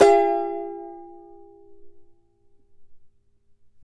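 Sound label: charango D major down; Spectrasonics; Hans Zimmer Guitars VOL-2; CHARANGO CHD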